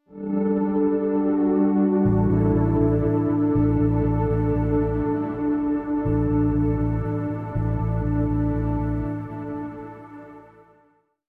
Link Sting

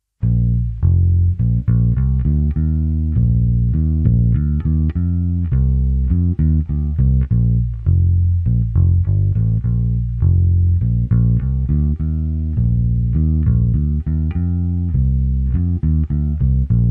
Down Home Bass

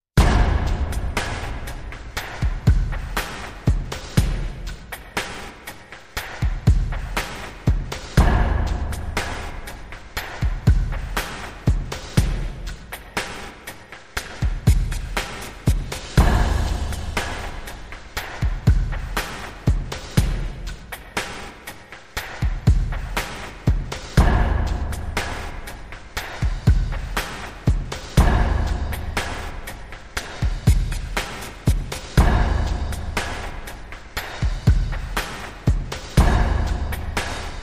Drum Cue

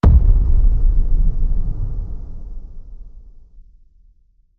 Hit Dankness

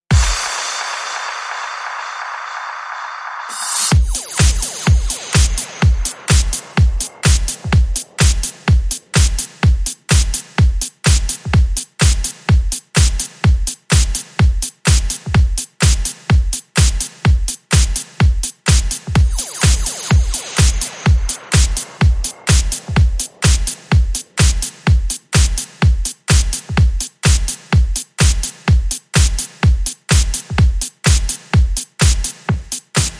Unicorn Beat

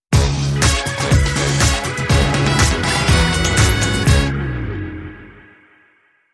Sting

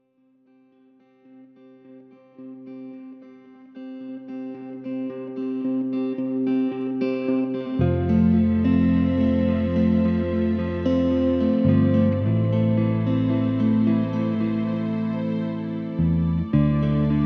Underscore

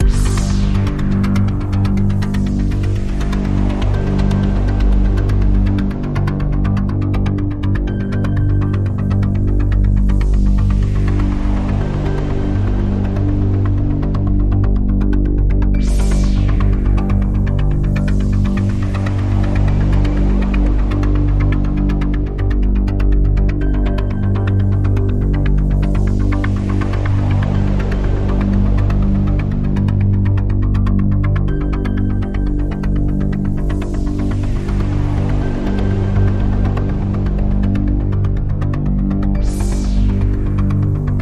Radio Toolkit Pad

